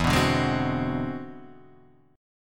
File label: E 7th Flat 13th; E7b13 strummed; JGuitar